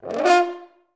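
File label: french horn F3; Best Service; Peter Siedlaczek's Advanced Orchestra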